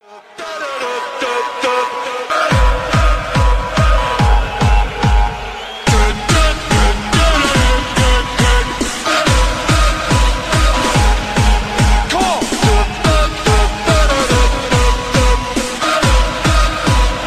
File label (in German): torhymne and fangesang